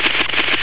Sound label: rustle